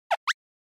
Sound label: Anime Motion, Mid